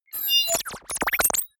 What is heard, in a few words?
confirm crazy, signale; soundpool